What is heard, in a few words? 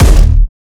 kick oneshot lilmonster F; GhostHack